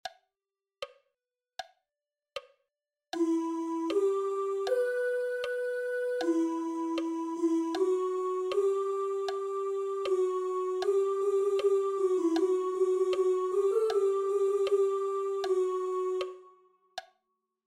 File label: altos; telemann laudate jehovam part3